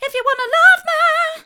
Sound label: hard, vocal